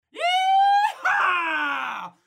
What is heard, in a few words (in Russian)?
krik